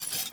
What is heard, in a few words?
Idle Sword Drop; Arthur Hub; Nineteen Ninety Nine